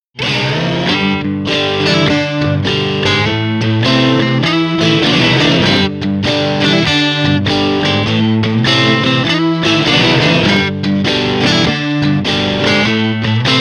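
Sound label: dance guitar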